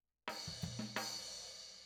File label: Tom